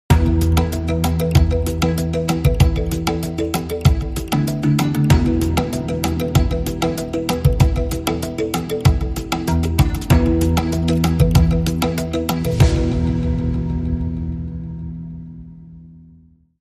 Beat, Bumper

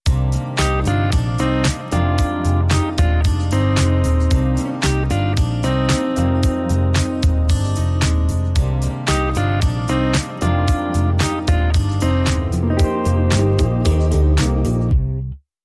Sting

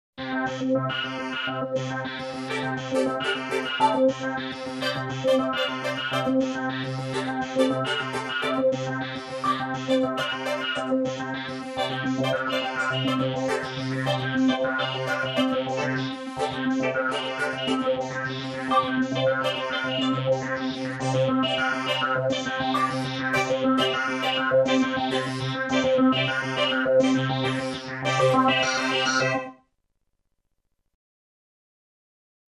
Rhythm

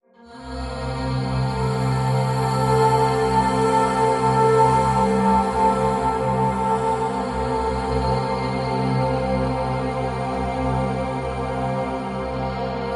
Solstice Drone

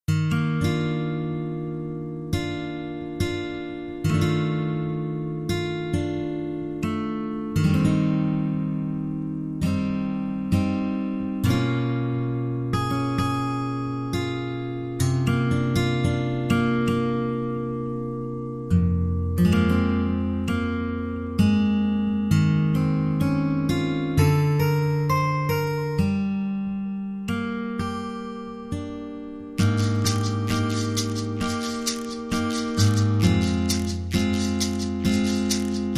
Underscore

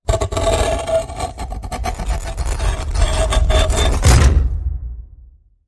Fingernail Scratches Hit

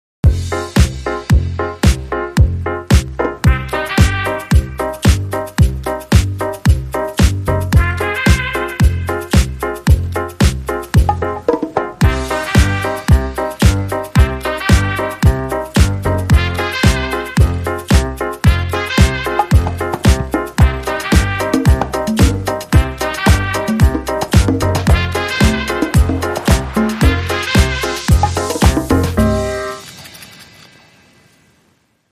Rhumba Action

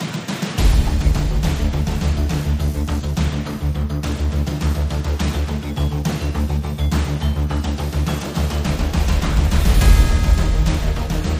Drums & Bass Only

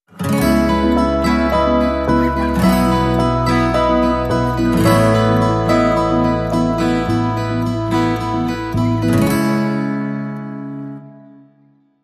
Bright Acoustic Days No Drums; 15sec Version A

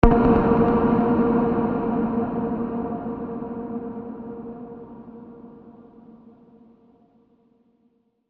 Muted Synth Ping

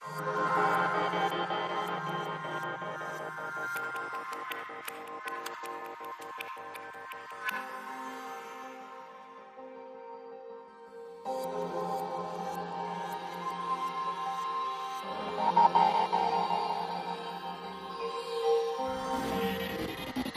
Pads Only

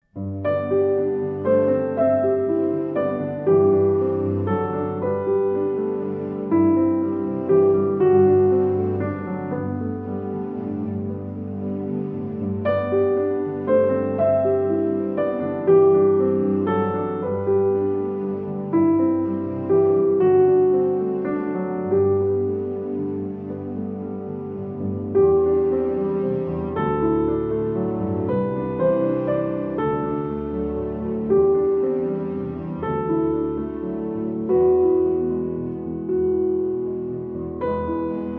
With Strings

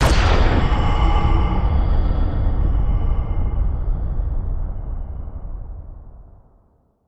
Hollow Boomer Impact

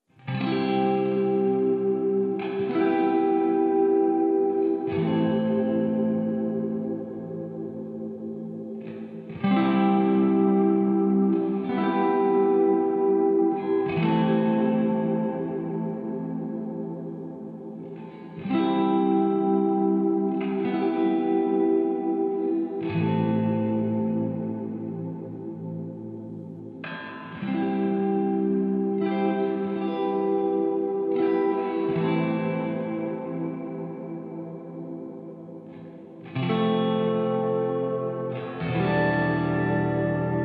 Ambient Mix

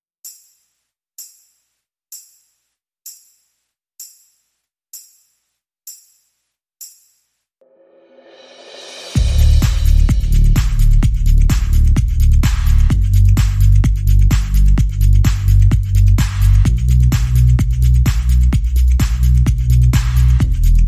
Heading Home Drum and Bass; 30sec

